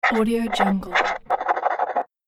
writing scribble; for infographics videos and games

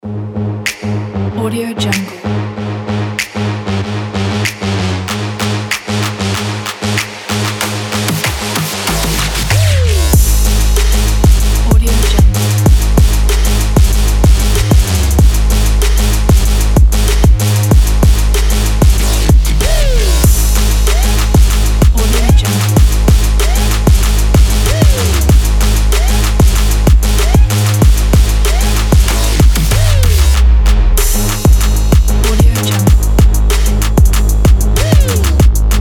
trap; maikonmusic

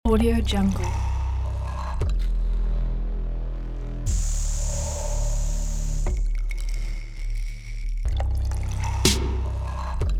abstract glitch

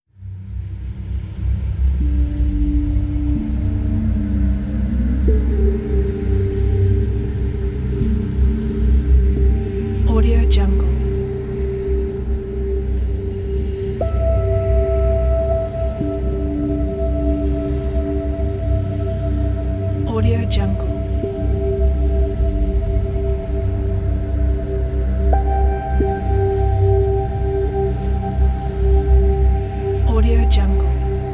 abstracted ambient soundscape; rigidrhythm

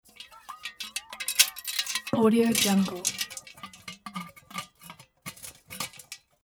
closing metal bottle with chain

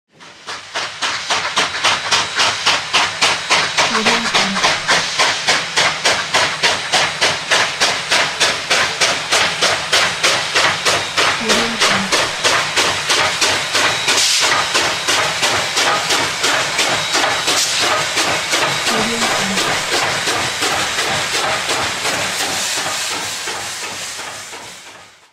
steam train chugging

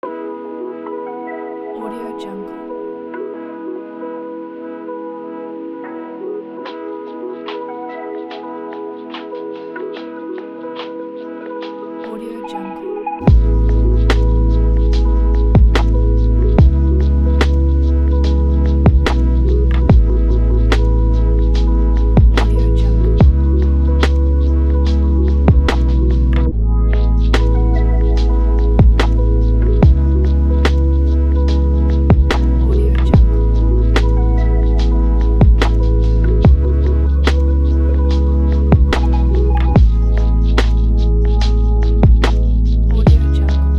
chill lofi

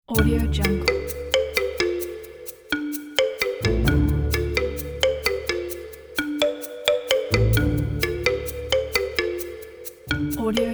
modern orchestral comedy

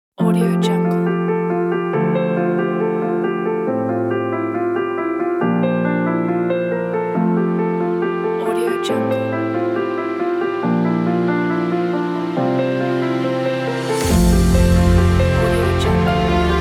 inspirational piano

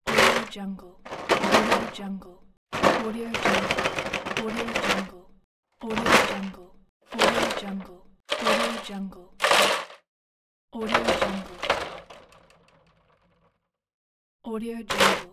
empty bottles crate

